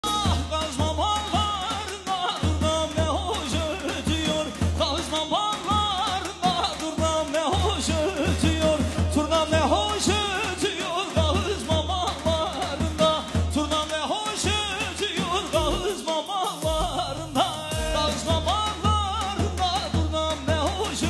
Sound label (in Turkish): halay, Erzurum halayları Kars halayları; Kağızman bağlarında